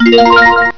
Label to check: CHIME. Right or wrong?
Right